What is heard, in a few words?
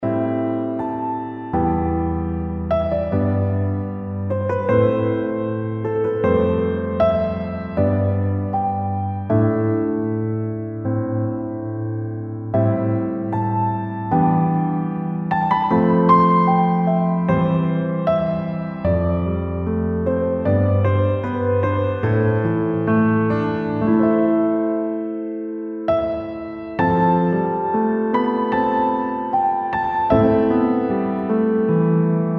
piano